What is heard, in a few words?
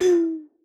Bottle Blown